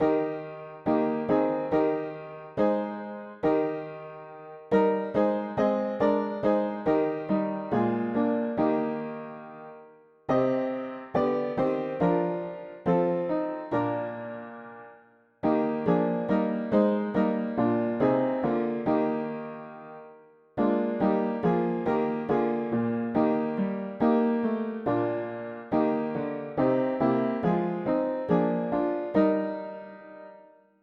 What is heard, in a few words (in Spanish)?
mono piano; hymns; Himnario pequeno